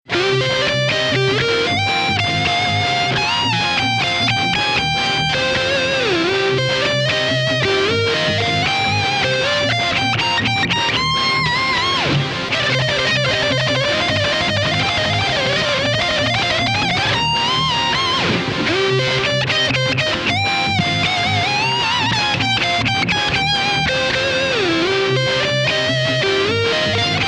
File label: Thunderverb50 Dirty Ibanez ART400E